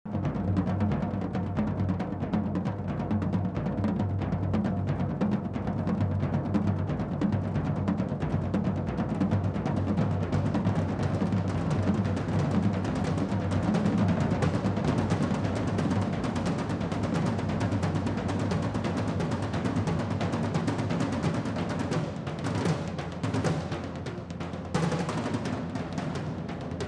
tambores

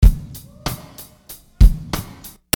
Hiphall

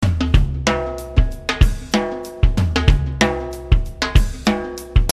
Tom Shuffle

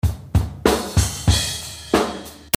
Beatcrash

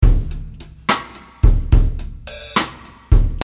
Slow Triplet

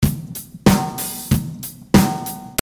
loops

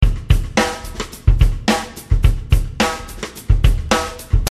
Standard Shuffle

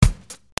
Bass Hat

